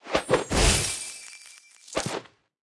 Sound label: Ice Wizard; 发射减速冰锥; 寒冰法师; 寒冰世界; 皇室战争; 部落冲突